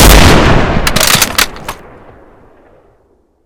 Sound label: shoot, weapons, m98b; M24 and M98B Reanimation; librarian m98b